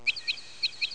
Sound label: TWEET